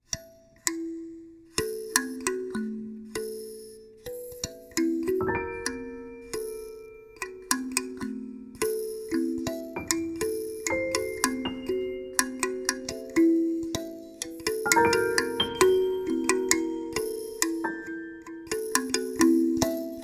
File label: kalimba piano